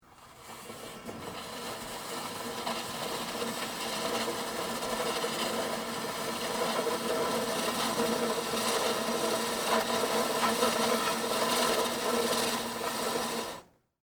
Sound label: Stage Curtain Open, Foley, Stage, Curtain, Open, Large, Theatre; Film Set Sound Effects